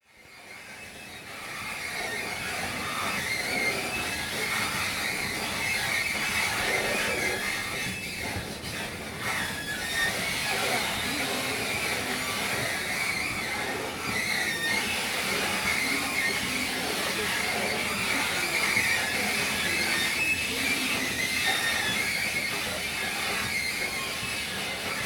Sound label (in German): berlin suedkreuz rolltreppe filtered